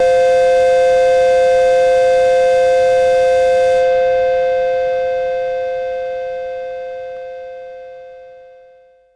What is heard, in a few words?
SYNTH Mediaval Flutish